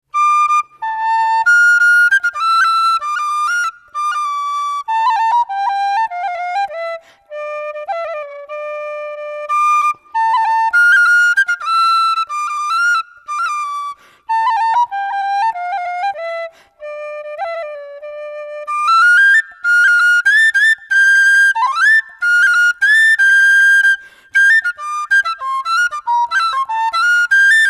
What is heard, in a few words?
high c slow